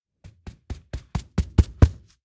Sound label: steps outdoor observatory run up kigurumi; awayuki; anemoi